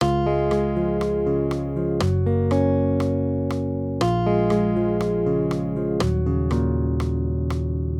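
Guitar, Accompaniment, Major Triads, Db-Gb, 120 BPM; maj5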